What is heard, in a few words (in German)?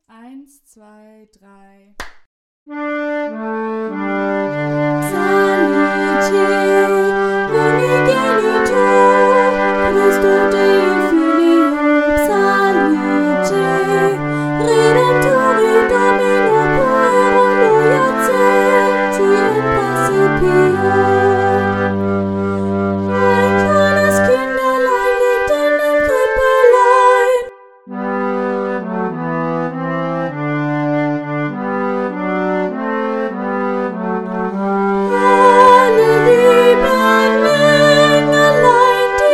sopran